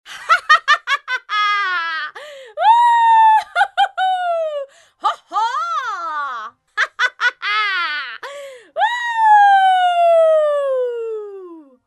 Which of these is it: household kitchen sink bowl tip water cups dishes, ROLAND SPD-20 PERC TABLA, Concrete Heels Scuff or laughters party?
laughters party